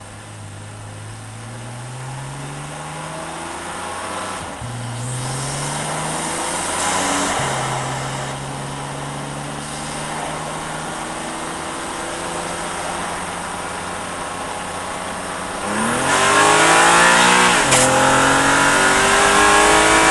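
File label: bmw m4 onboard drive gearshifts engine center; dpa4061; sonniss gdc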